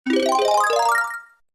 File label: The "Power Up" fanfare